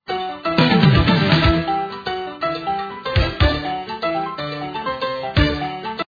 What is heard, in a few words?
conga